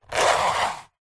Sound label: table tennis portable retractable net pull open out plastic housing; zapsplat